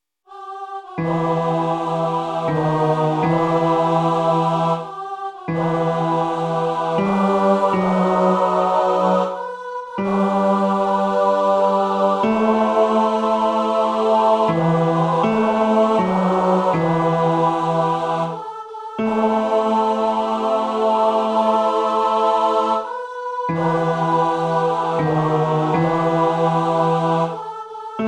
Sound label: bass